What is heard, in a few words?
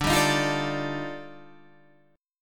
Eb Major Sharp 11th D